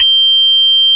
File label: Dulcimer C6